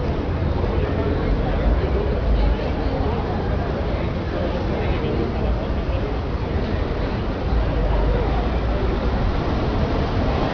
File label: street, ambience